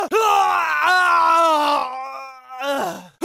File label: human scream; cyrax